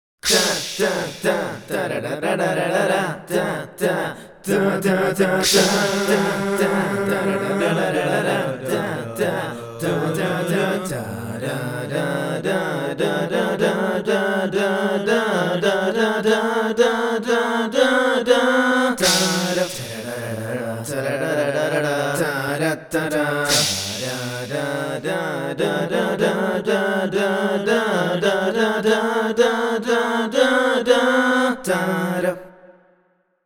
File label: A Capella Fighting; Final Fantasy Inspired